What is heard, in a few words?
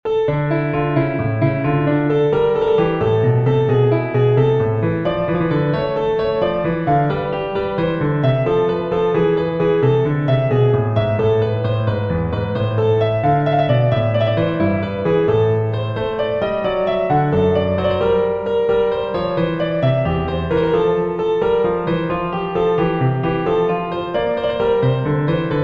Prelude in d minor, with some contrapuntal flavour; No 6